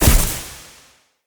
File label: Casting Device Block Impact; Weapons; Player